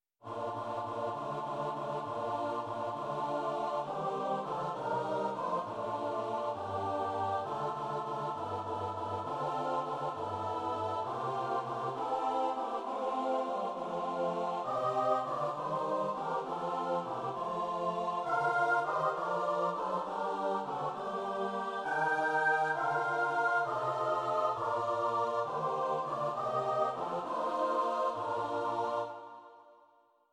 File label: Caroling caroling